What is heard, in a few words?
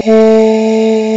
AAHH; HUMANS; CMI Sound Library Rev 1.3